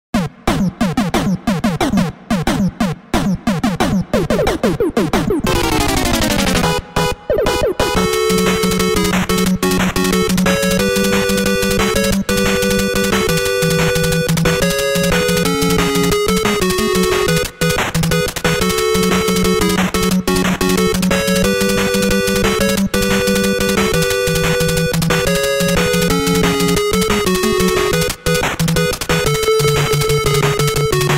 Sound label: mmsoundtrack; Mega Man 2; gamesoundopedia